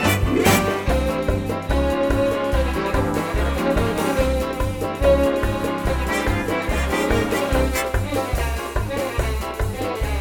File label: Accompaniment; The School Musical 2024/25 - Guys & Dolls Jr.